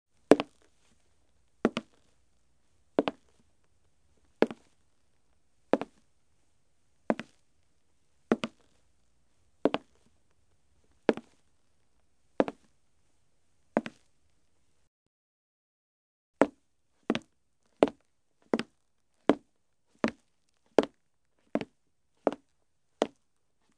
Steps on the wood floor in shoes

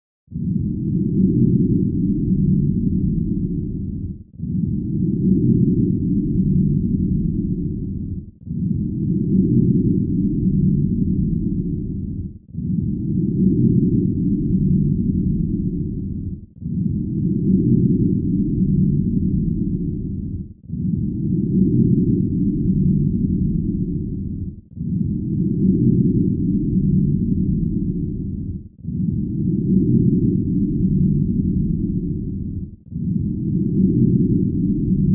Pulsating signal with low frequency and hum; radio signals

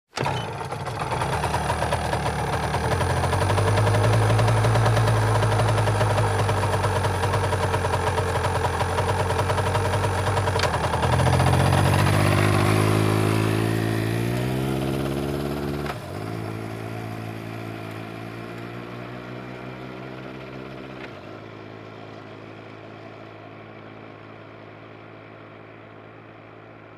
Motorcycle starts, waits, drives away

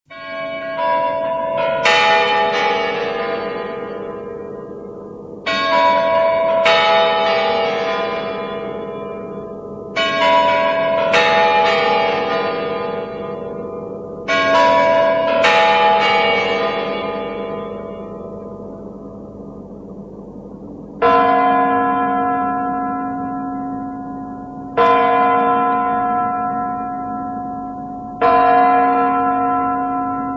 Kremlin chimes; watches

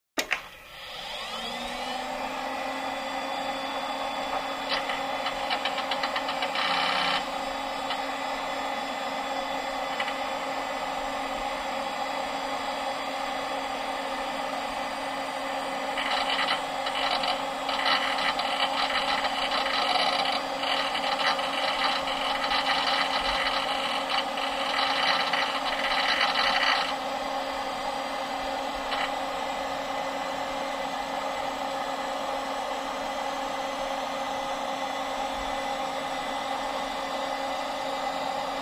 Turning on the computer, fan noise, shutting down

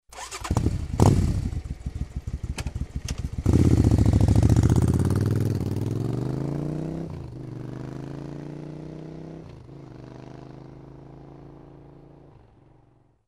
Motorcycle starts, drives away

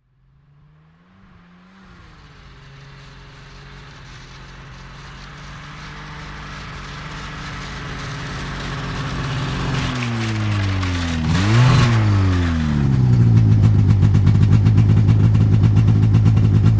Snowmobile passing by

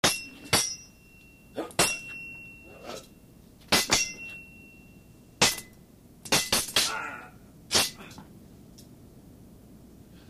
Two people sword fighting; cold weapons

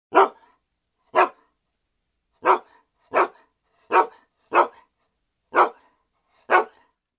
eskimo dog

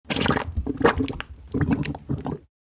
Bubbles in the water

